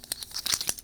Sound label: Cable Coiler; bb - Smol Mechanisms